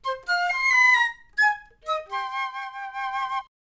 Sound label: flute piccolo